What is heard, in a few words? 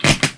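small spark, electricity; SINDEMO; environment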